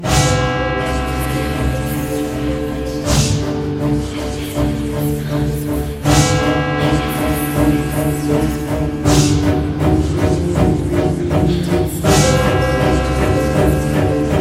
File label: Horror Theme